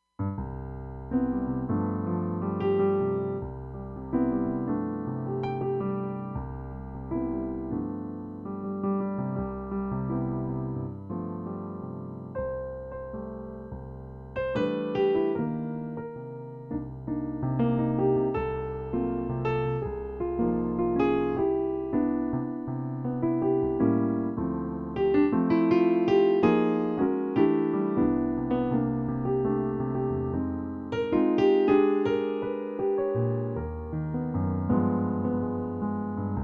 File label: Piano